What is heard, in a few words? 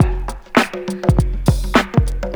Drum loops, Funky